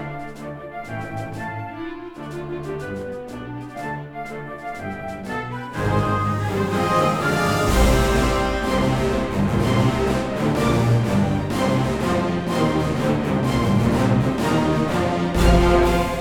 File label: hurryup; Zen Pinball Party; How to Train Your Dragon